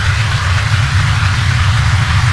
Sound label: snake loop, ships engine; Quantum Redshift; XBOX; yuri